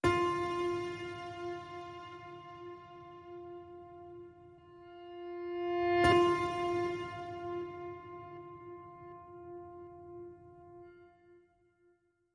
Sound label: Just A Piano Note F